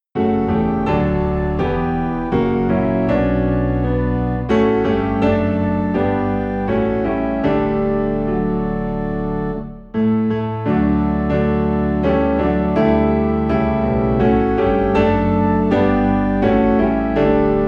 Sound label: Piano and Organ Low in D; Infant Holy Infant Lowly; christmas